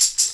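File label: TAMB, tambourine; Percussion; InVision Interactive; Percussion Slam; FALL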